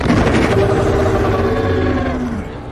roar; Skar King

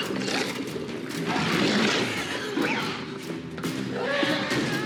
roar; Vinerat

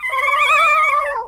Roar; Little Godzilla; Heisei

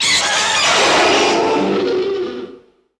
Roar; Ghidorah

